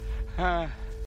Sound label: Honk; Voldemort